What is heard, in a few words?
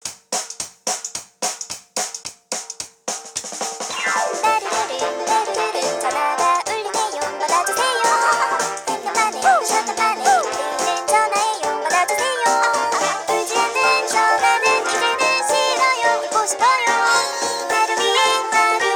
Buzz, ringtones; Samsung; Galaxy A SHW-M100S